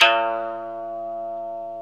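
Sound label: ethnic plucks, koto; Eth Plucks, Pluck Duo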